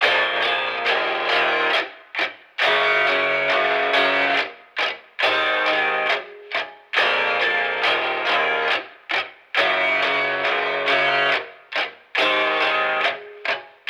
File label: Melody Loop Guitar A 69 BPM LoFi Ambient